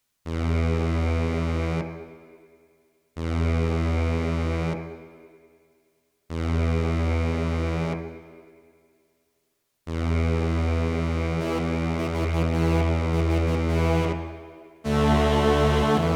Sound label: Chime In Prelude; hyper terrestrial